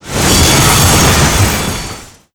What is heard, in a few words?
airport elevator screach; Call of Duty Modern Warfare